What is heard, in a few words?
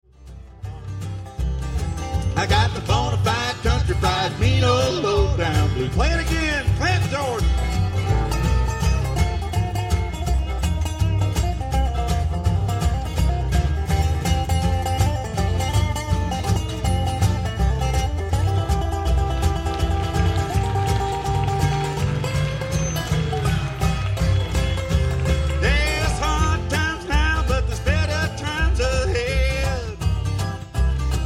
Blues, String Band